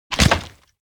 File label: floor crack; anemoi